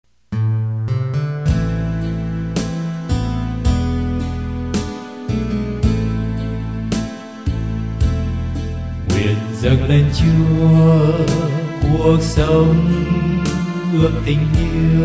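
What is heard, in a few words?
soprano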